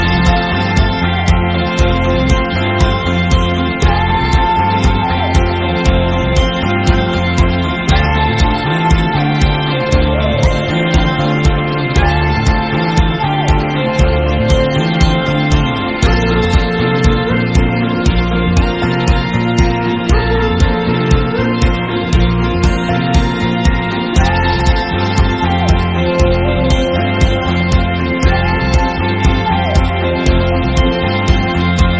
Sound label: Romantic Bgm